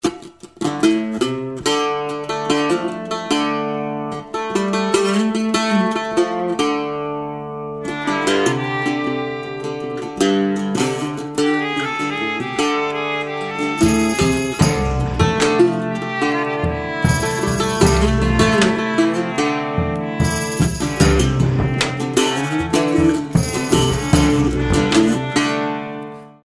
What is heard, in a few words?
8ve mando